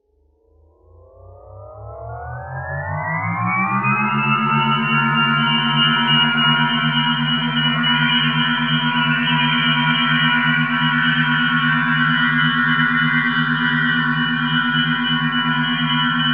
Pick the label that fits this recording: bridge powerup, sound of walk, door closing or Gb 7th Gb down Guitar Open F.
bridge powerup